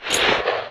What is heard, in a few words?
generic draw, weapons